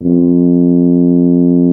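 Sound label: French horn C3; MONO BRASS; Greytsounds VOL-1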